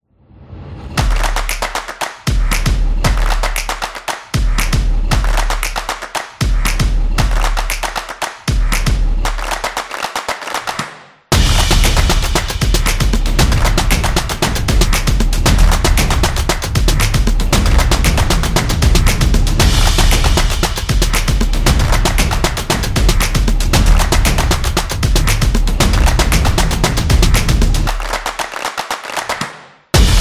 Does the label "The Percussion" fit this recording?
Yes